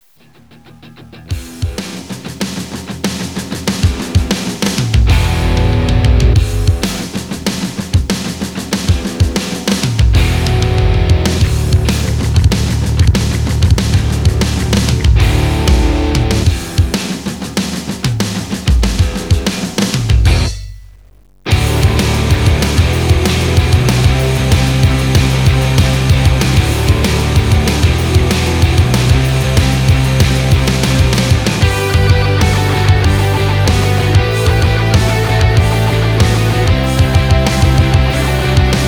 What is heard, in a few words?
drum fast, 190